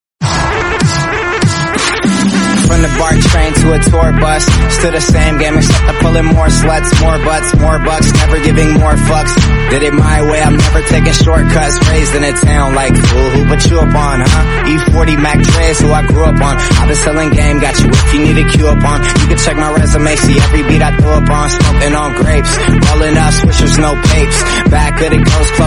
remix attitude ringtone

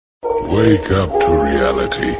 phonk sound notification

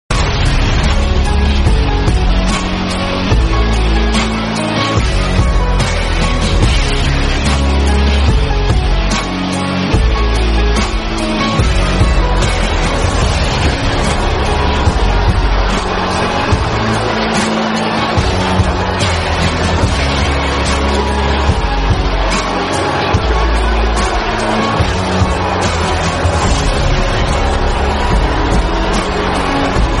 bgm theme; roman reigns; tribal chief

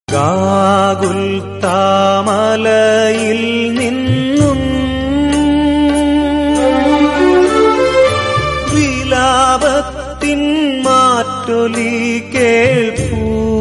malayalam song

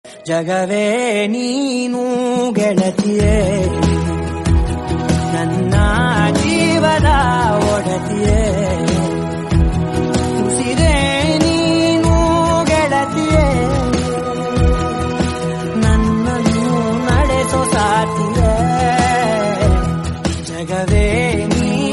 kannada song; love 360

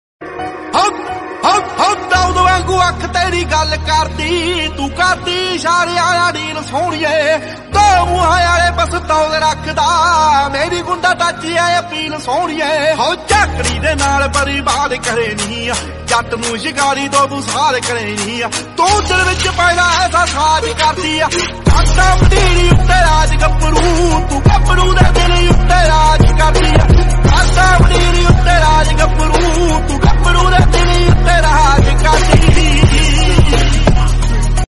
gangster punjabi

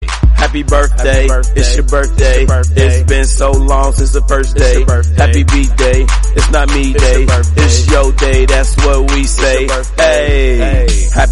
happy birthday english

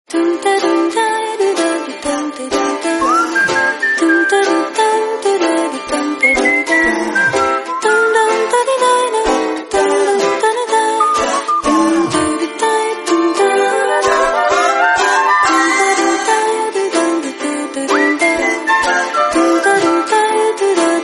party mobile tone; zte

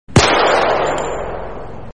9mm gunshot sound

